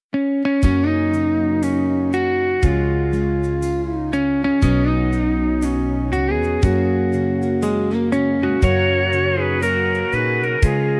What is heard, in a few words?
Happy Birthday Pedal Steel Guitar, instrumental Version